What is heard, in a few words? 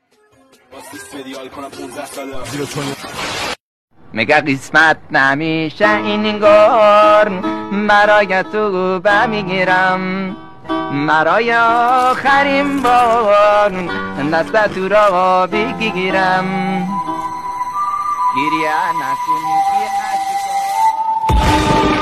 new music tanze khandedar